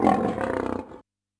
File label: LION, Yamaha DD-65